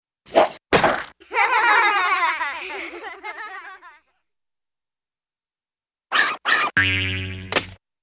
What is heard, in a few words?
Swish By Juicy Pie Splat Double Screech, Cartoon; Hollywood Edge; Cartoon Trax